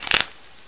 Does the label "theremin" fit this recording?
No